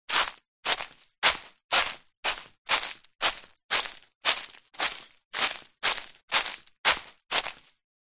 footsteps walking soft shoes dry leaves forest medium